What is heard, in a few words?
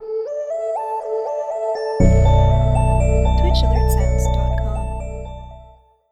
Atmospheric Host